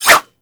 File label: Dagger Whoosh; Warframe